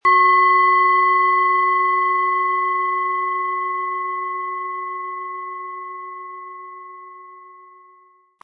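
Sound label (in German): Planetenschale Biorhythmus Geist mit Klöppel; 180-260 gr.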